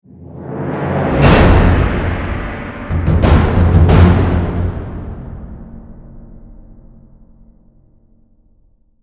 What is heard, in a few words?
battle end; netease